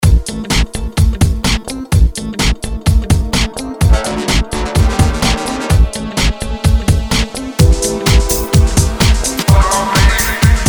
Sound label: Electro